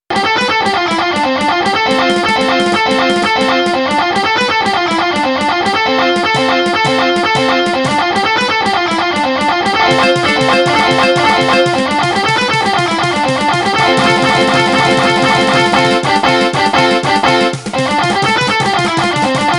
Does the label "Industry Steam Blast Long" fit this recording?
No